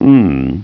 humm; BattleSNDS

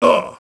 hit; BattleSNDS

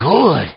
LAUGH; BattleSNDS

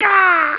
DYING; BattleSNDS

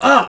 hit; BattleSNDS

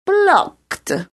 locked; BattleSNDS

